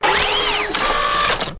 mode switch

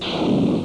quiet explosion; Spheres 1.00

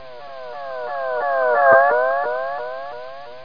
Fly By; Tic Tac Toe 3D

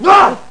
Karate; FightingSpirit

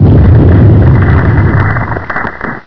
atomic

